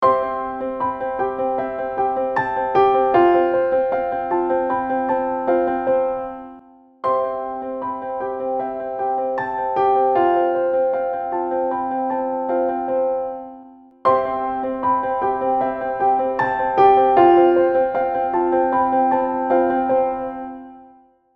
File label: piano verb比較